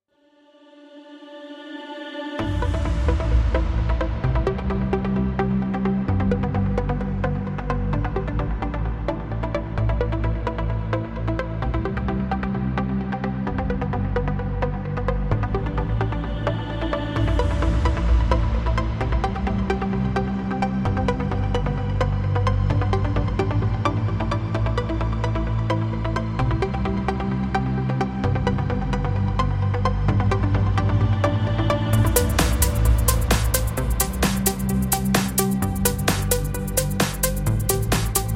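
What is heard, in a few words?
Uplifting Trance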